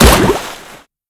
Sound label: weapon; Keeper Of The Deep; FAMAS